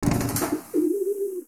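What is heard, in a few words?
Bird Trapped wood; Project Zomboid v41.73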